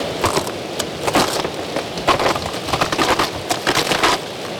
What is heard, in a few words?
Ice Steps, On The Glacier